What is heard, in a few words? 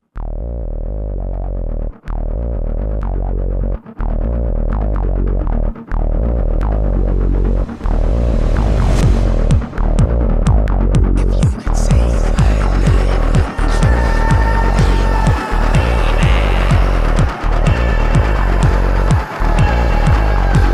Chair Groove; Munch